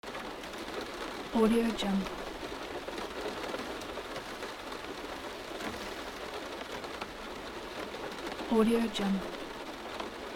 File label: soft rain on car windshield